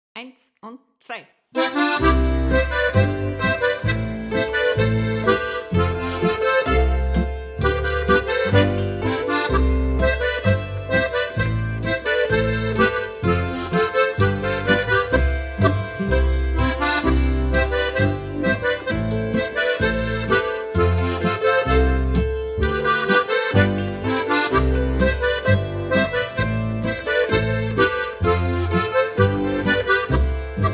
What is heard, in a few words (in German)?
Heiliger Boarischer